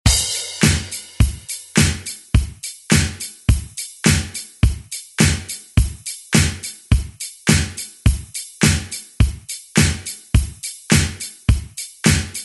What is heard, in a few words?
Re Drum; DJ Mhark